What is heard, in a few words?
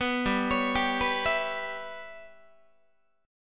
G 6th Flat 5th G, Guitar; arpeggiated; 14,15,16,17,15,15; 4A,4E,5A,5D,5Gsharp,6Csharp